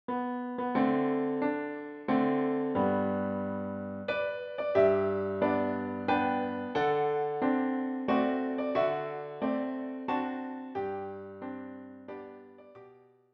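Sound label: piano four hands; Happy Birthday to you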